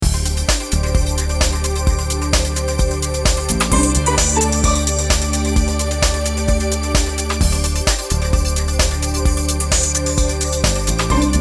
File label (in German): Soundbranding Automation Warteschleife, music on hold Warteschleifenmusik; Lanfer